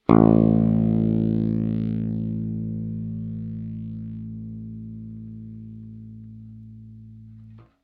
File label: Noise Example